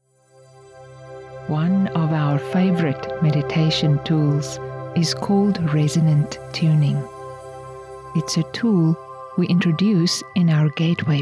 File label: resonant tuning